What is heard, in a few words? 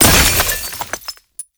Void Heavy Scythe Crystal Explosion; Warframe; Duviri; Incarnon Adapters; Melee